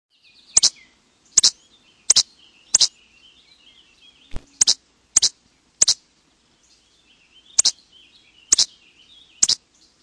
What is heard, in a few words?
least flycatcher